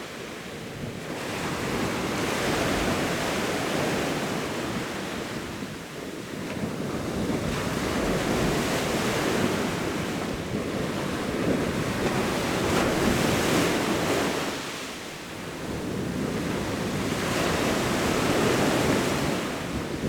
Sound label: Playa Forti; Curacao; Rode NT 4